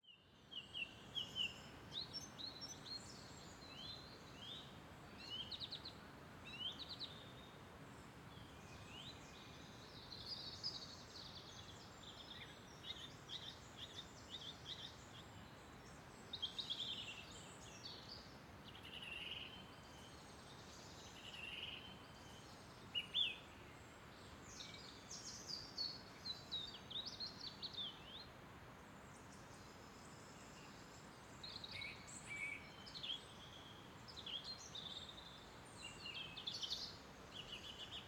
Forest, Daytime, Birds, Outdoors, Nature, Spring; UK Canals, Parks & Forests; 344 Audio

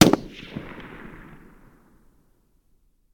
MOSIN-NAGANT mixed long distance shot; FLYSOUND

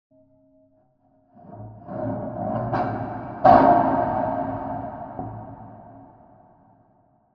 Tense Metallic Tone, Cinematic, Eerie, Wail; Jake Fielding; Haunted Metal Vol.3; Cinematic Tones & Textures

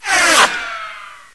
near miss, weapons; hl2

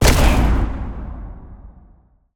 Medium Realistic Explosion; DavidDumais; Explosion SFX Pack

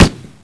hmg, weapons; hl2

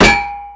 metal, bullets; hl2; impact

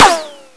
ric, weapons; hl2

